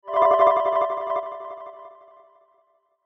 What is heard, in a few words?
Sweeper Soft Synth Ascend Pan Delay; Production Element Imaging Element Accent Transition; Sweeper Sound Effects